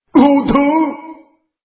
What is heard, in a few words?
Monster Death; yule; fdfish